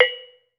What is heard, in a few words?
PANARUS; Metalophones; E-MU Producer Series Vol. 7 – Old World Instruments